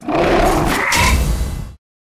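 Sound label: Rat attack mid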